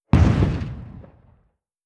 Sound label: light cruiser attack; Hearts of Iron IV Death or Dishonor